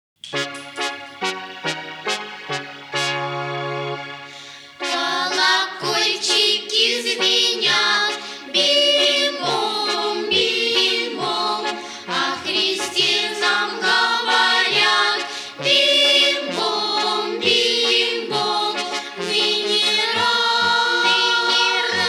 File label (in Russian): Колокольчики звенят